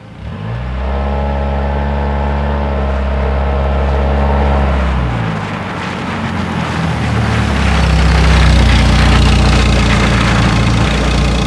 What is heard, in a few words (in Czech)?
auto couvani; Mafie 1